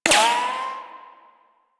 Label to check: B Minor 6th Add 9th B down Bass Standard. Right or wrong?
Wrong